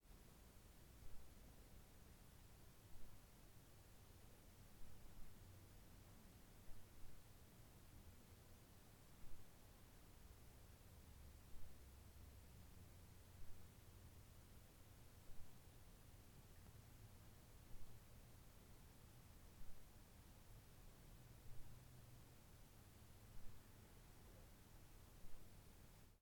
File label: Kitchen Room Tone